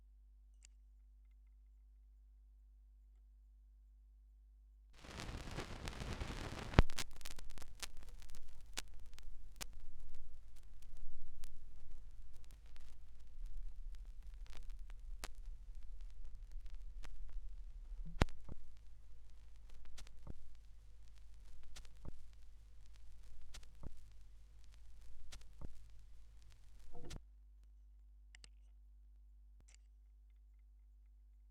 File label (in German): schallplattengeraeusche